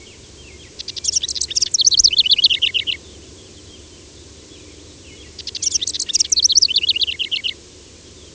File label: House Wren; ibm glen